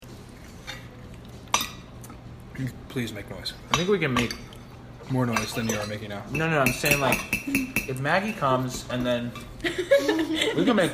house playing cards with friends; 4/6/17- Netherlands Hague house playing cards with friends; Field Recording

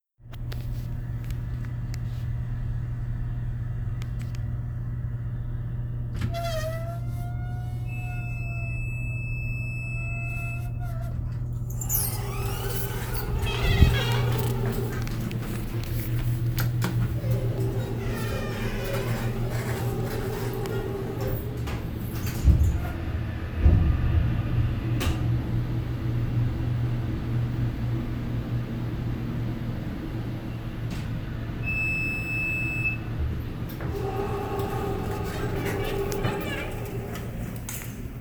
Elevator Ride

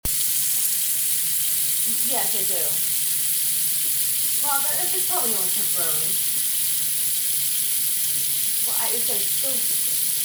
The Sink Running and Mom Talking On Phone; sink running and my mother talking; field recording

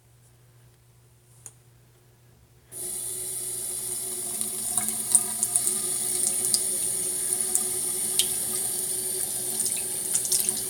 Washing Hands, bathroom; Location: Bathroom

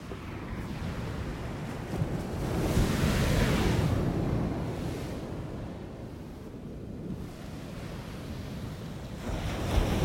Long Beach; Location: Shore of Long Beach, New York

